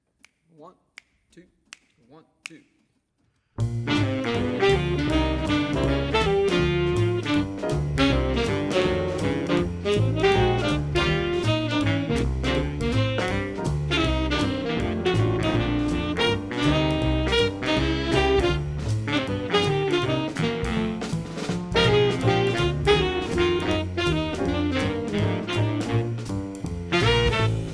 Jazz Etude Sax

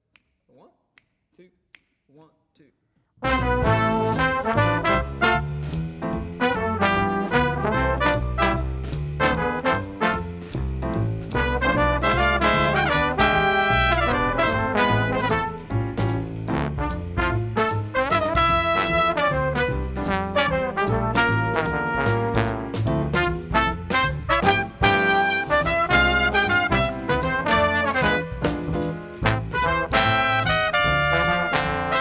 Jazz Brass; 2019 All State

